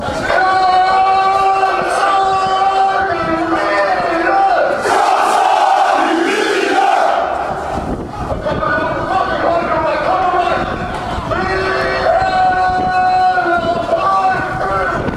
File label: fanchants; agf video